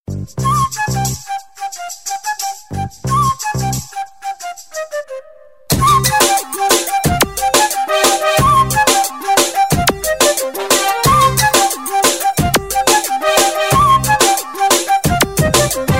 flute instrumental

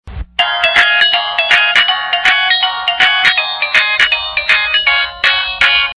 ringtone; my lovely sam soon; samshik

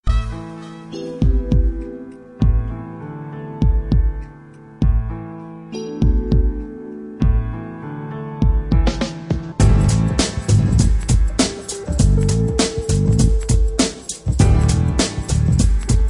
smooth jazz

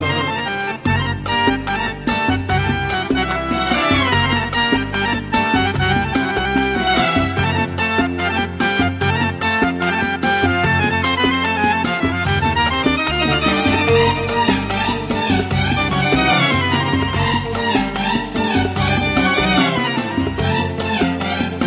sad arabic music

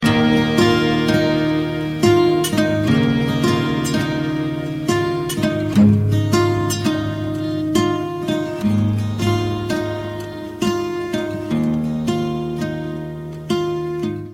best melody msg

